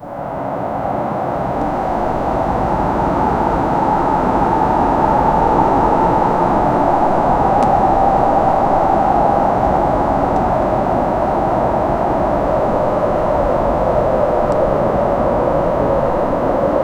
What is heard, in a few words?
gentle wind and soft snow; sound effect